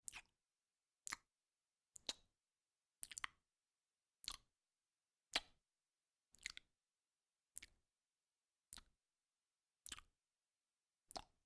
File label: lip smacks